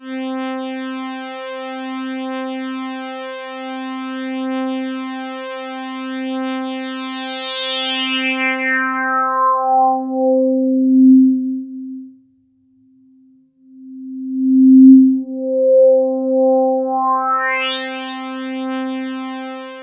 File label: C3, SynthLine; 90 s Sample CDs; NiceBeats; SynthLine VOL-1